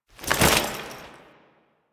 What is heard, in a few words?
Foley Weapon Swap; Warframe; Kahl